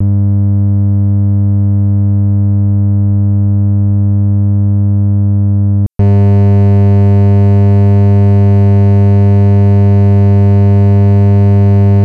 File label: 100hz ripple